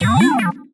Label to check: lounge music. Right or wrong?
Wrong